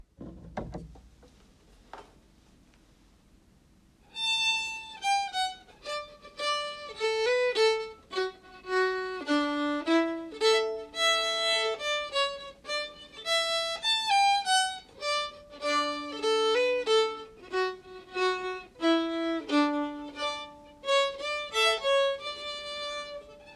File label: Canadian Reel slow, fiddle